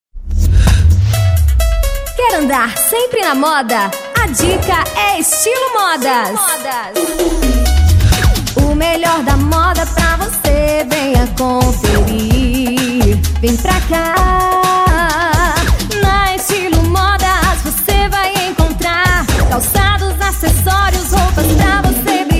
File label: JINGLE; ESTILO MODAS